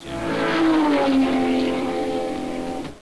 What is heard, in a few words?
Porsche